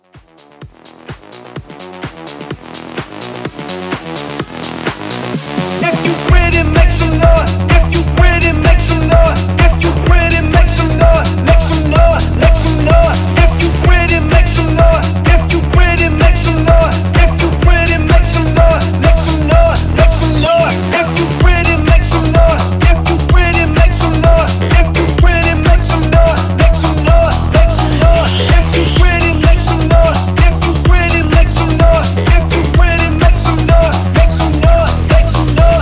House Vocal

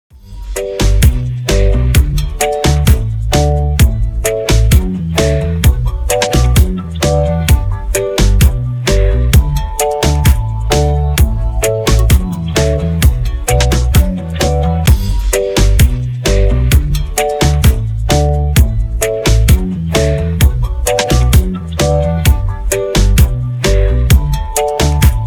Extended Latin 130 Bpm

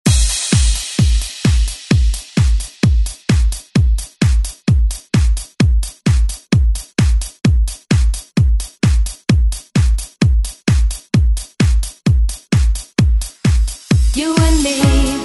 90s Re Drum; DJ Mhark

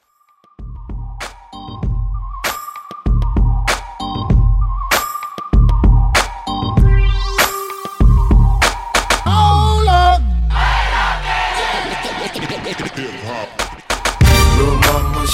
Dj Intro 97Bpm

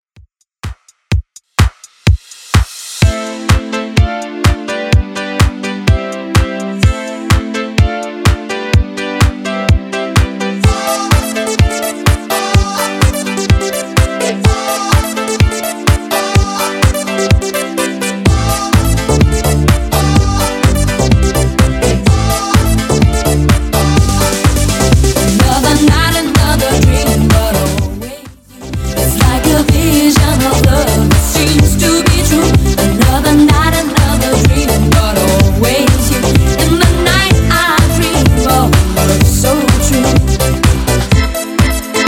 90s Redrum 126bpm

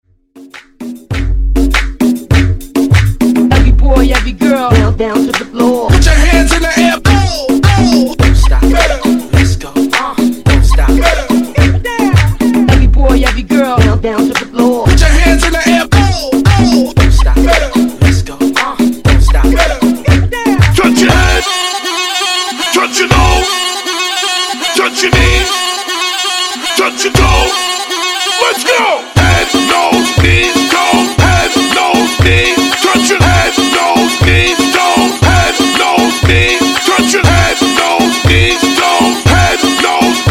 Transition Dj Intro Outro 100 119Bpm

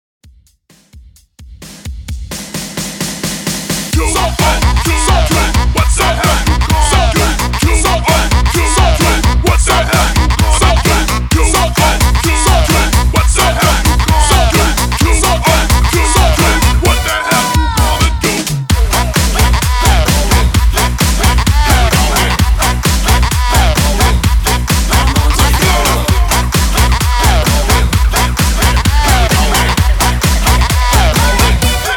130 BPM

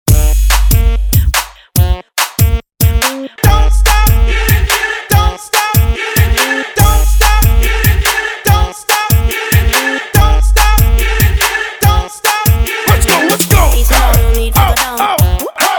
Uptempo Hype Rework

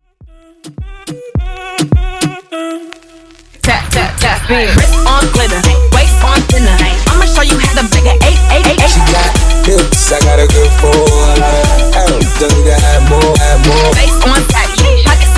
Funk Transition, 105 127 Bpm

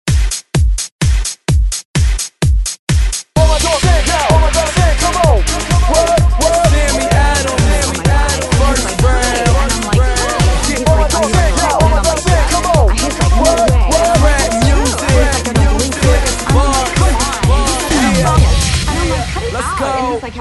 20s Hype ReDrum 128 Bpm

